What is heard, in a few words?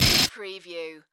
Glitch; Sound Effects Bible